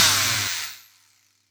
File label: saw stop fast; weapons; Sourceforts2.0